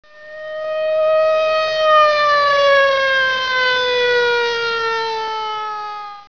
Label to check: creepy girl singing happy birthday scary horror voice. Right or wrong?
Wrong